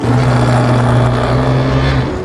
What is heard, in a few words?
Parrot Frog Kong